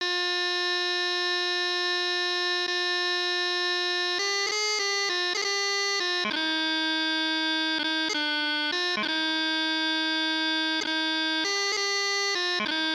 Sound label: Chanter; North Berwick Pipe Band